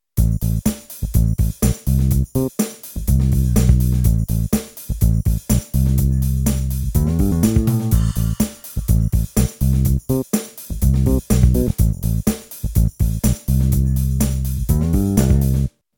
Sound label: disco test